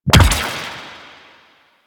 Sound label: Eye Grenade Launch; Ambience; Orokin; Warframe; Orokin Moon